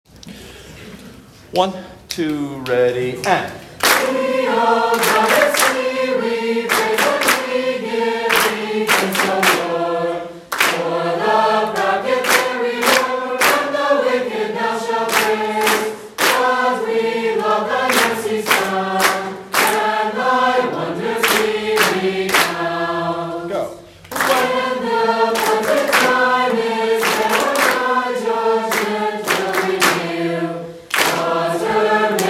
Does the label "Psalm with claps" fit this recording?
Yes